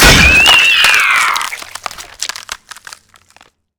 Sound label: snap, ships; Ratika